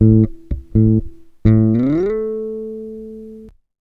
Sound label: WALT 122 A, Bass Grooves; USB Soundscan vol.30; AKAI